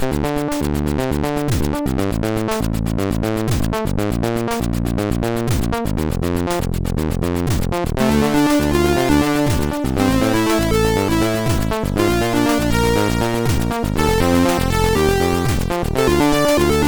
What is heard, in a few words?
Bass Rumble, MOS6581R4